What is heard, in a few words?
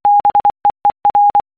beer morse code